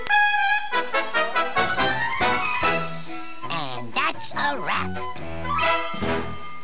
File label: exit windows; etch a sketch